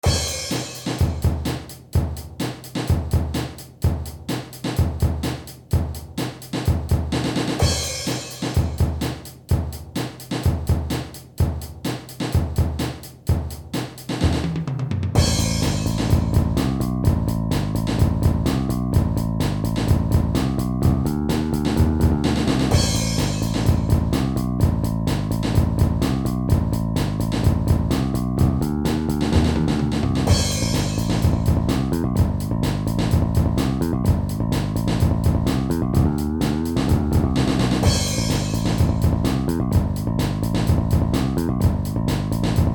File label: Drum Girl